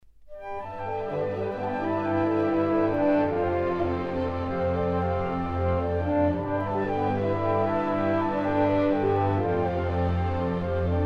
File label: Music Creature